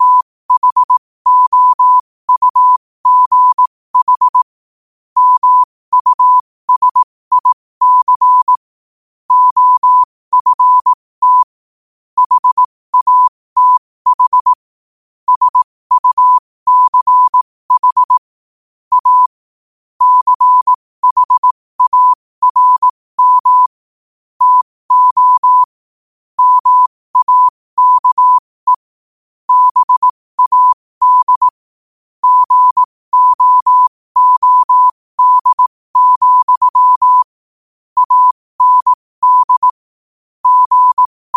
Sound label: 5 wpm, morse